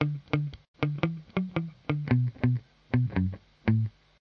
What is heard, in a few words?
114 B guitar; mgtr